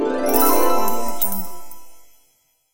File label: magic coin